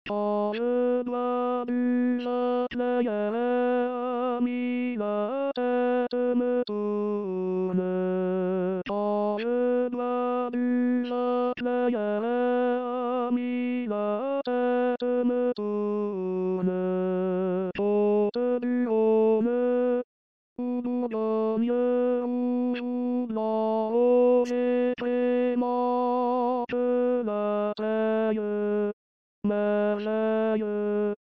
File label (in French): tenor; l harmonieux vigneron